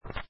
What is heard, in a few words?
card flip; pharaohslotscasino